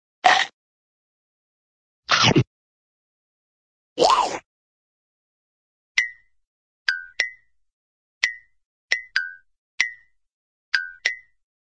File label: bite, cartoon bone bite